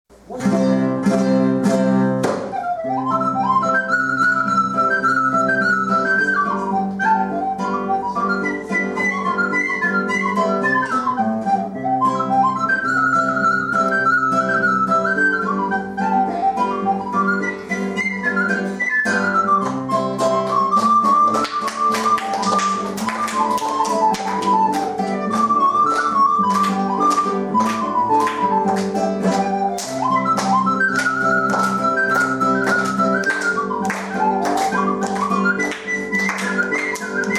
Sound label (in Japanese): リコーダー; 最後はリコーダーです。; 小学校・音楽鑑賞コンサート; 日本では西洋のリコーダーを教えています。; 貝塚市葛城小学校